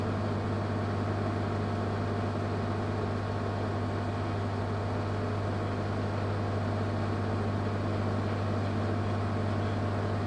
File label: Microwave Oven